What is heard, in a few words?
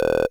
Drums, Hits; DCM8